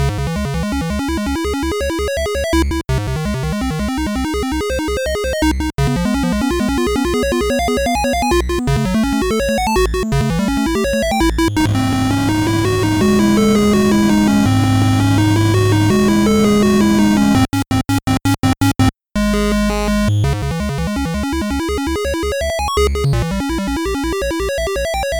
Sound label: 8bits; Moonlight Sonata 3rd mvt